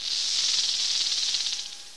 idle, antlion; hl2

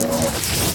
physcannon claws close; weapons

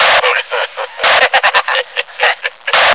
laugh, hgrunt

